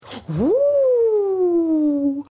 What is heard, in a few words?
float huuu